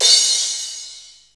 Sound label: Crash